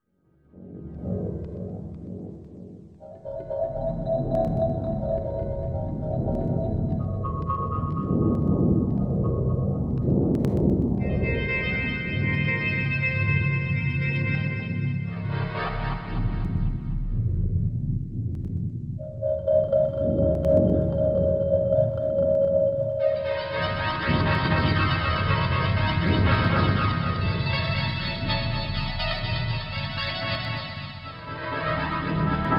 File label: C Dorian 60, Deconstruction